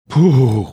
making a shuddering sound

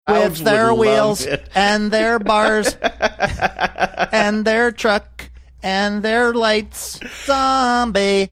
singing with their wheels and their bars

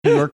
quick inhaling laugh sound

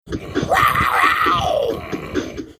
panther growl

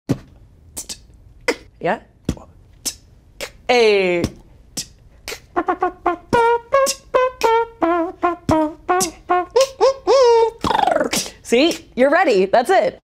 beatboxing; cbs sunday morning

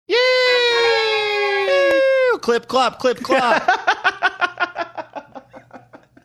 making fanfare sounds saying clip clop

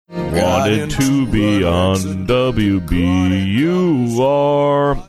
singing mmmmmmmmmmmm; wbur

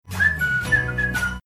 whistling

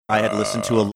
making low drawn out sound

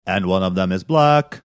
singing and one of them is black

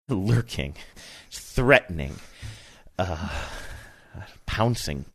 lurking threatening pouncing

